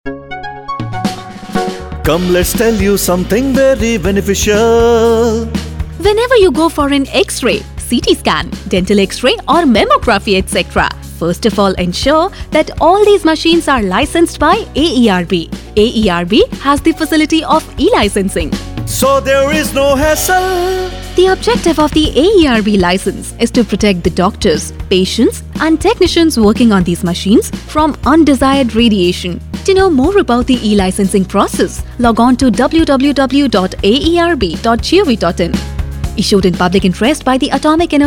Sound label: English Jingle